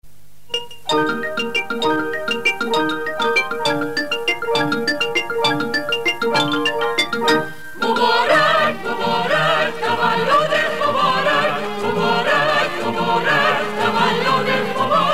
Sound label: Choir